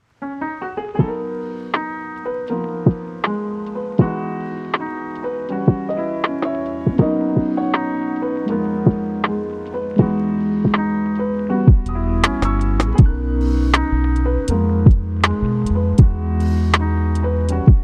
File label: Smoothjazz